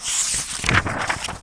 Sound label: TURN; Gothos